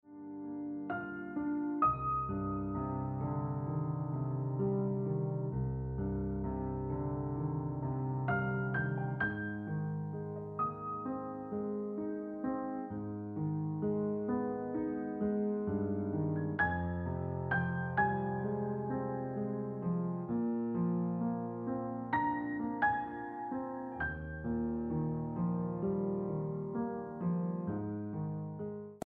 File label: Gentle Ringtone Piano Music